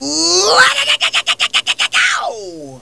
scream

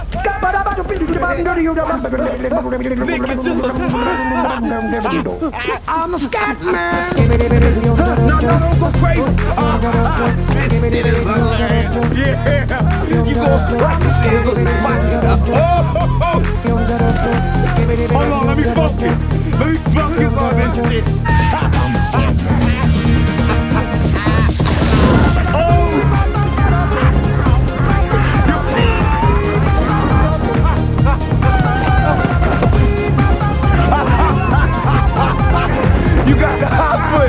scat

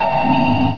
ping

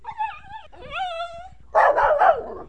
bark

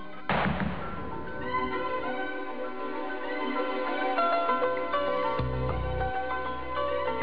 theme song

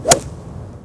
golf swing

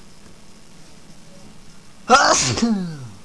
Sneeze

